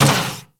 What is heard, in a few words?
Moa Combined Step Default; Warframe; Footsteps